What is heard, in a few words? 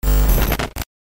Computer glitch accent